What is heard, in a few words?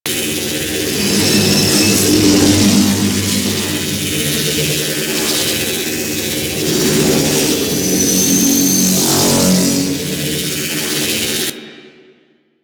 Warp Gate Entrance Loop; Warframe; Sentient Devourer